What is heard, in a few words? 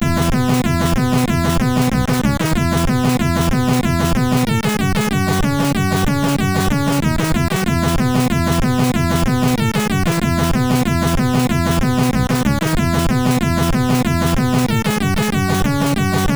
Funny Music, sid MOS6581R4